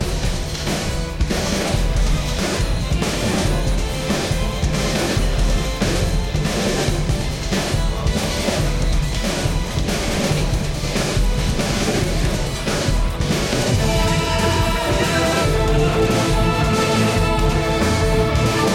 organ viola cello epic chorale agressive drums 290 bpm